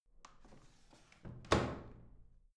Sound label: washing machine drawer in; haus garten; haushalt